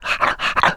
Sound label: happy; crystal maiden